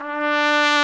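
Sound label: BRS TRMP, Brass Trumpet f; Roland L-CD702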